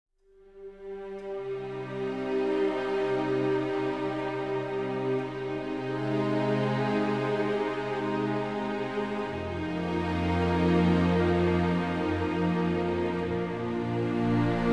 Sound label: No Vocals